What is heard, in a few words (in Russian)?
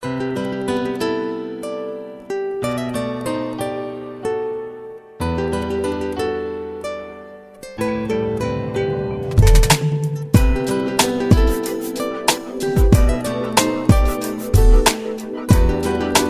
Мягкая Мелодия